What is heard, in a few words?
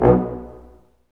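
BRS Large Ensemble stac; Roland L-CD702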